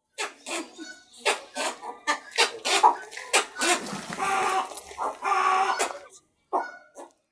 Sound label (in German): Kuhreiher Ardeola ibis; Vogelstimmen